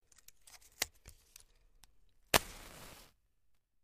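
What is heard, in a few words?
Match Strike From Pack; lighters & matches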